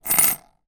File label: foley money australian coins x2 down on hard surface